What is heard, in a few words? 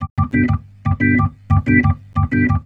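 reggae organ G; Best Service Advanced Media Trax Modern Composer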